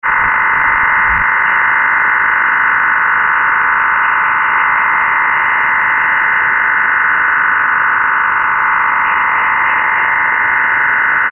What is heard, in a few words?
FreeDV; ham